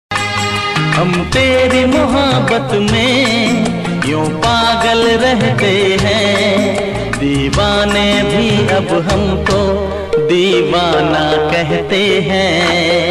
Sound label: 90s old ringtone old song ringtone 90s love song ringtone